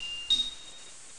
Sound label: Player Heal; the-purple-night